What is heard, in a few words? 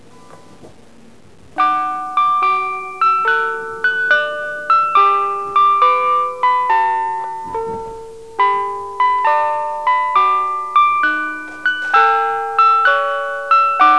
sazanami, chime; JR東日本183系